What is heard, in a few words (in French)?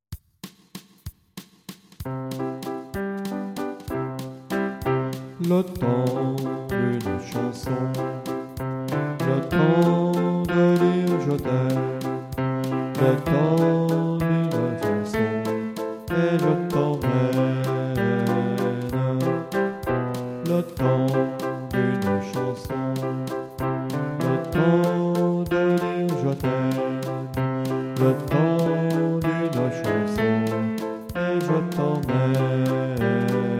basse